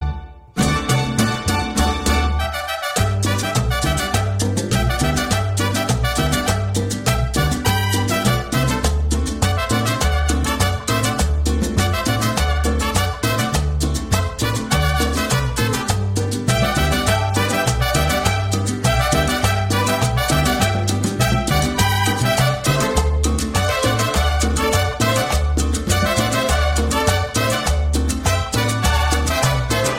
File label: Mexican Fiesta Mexican Ringtone